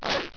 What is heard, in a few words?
spark